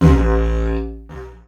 Didgeridoo Kit Samples

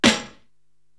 SD Clap Hop, Korg TR-Rack drum machine

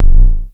TOM, Klone Dual-Percussion-Synthesiser; KLONE FX NW8

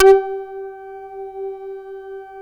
BASS G4; Q Up Arts; Dream Experience

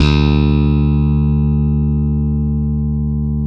SLAP BASS, Electric Basses

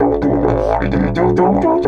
130, Didgeridoo

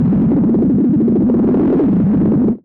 WIND RATTLE, SYNTH FX; AMG - Vince Clarke Lucky Bastard

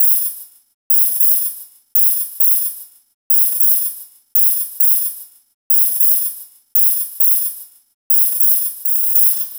Hats Tap Groove; Sonic Foundry (Sony Creative Software); Loop Noir; Session 12 - Otherworld Journey

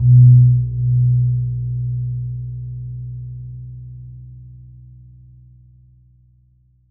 GONG B1; USB Soundscan vol.60; Asian Traditions; AKAI

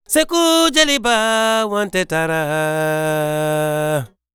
African Lead Vocal; Continental Drift Aftershocks; Vocals

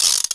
Ratchet; Roland SPD-S

